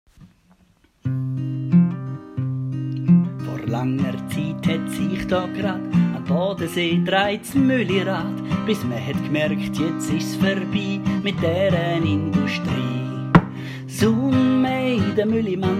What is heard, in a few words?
Song; Energieeffizienz